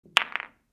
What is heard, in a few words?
Pool balls soft impact